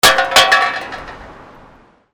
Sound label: HIGHWAY